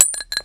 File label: ammo casings; weapons